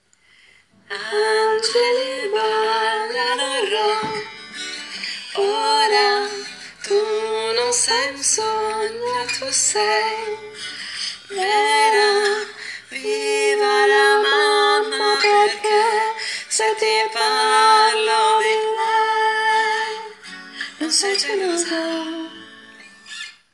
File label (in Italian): soprano